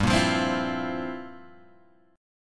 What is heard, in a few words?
G Minor 13th G down Guitar Open G